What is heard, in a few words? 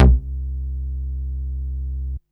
Basses C; Vengeance Samples